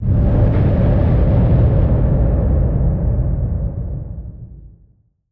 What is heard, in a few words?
gameover; Mutually Assured Destruction; StudioFreresChasseurs